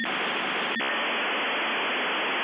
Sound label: segment conexant hsfi